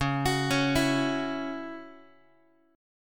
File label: Db Minor Double Flat 5th Db down Guitar; x,9,9,11,8,x; 4A,4E,5A,5D,5G#,6C#; arpeggiated